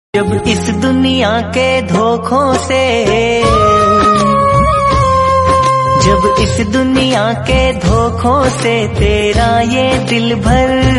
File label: Hindi Bhakti